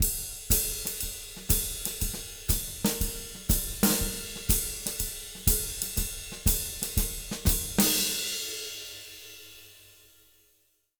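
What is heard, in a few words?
120 SHUFFLE, Jazz Latin Drumloops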